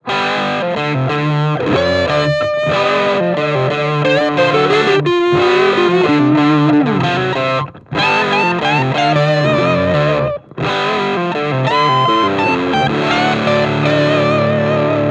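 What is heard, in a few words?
Les Paul humbucker Bridge pickup with solo; eminence 820h; Billy Penn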